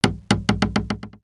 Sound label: Platform Fall Start; ninjago